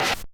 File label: kawai k1 RIM; wavetables